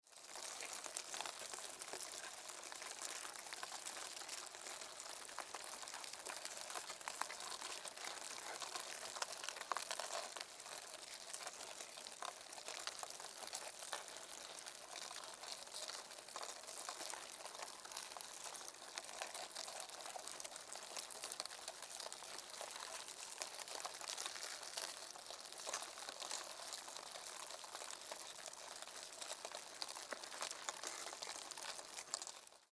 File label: Insects Mealworms, Insects Mealworms Larva Movement Slimy; Bugs; Animal; Insect Sound Effects